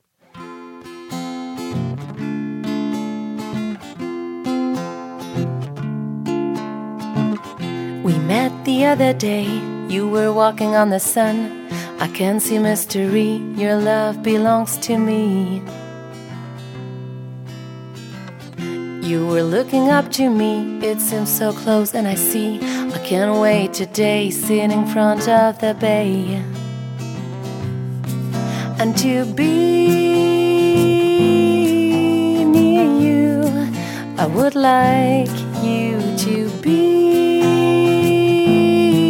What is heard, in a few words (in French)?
chanté; Fortepharma